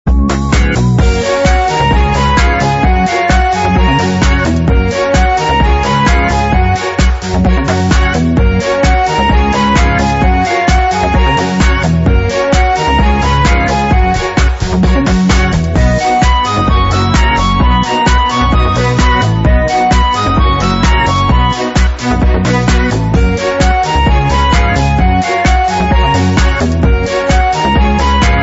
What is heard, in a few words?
violin version; Bomb Jack subtune 6